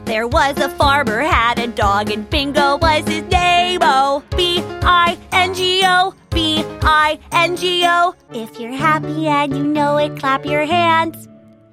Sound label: Songs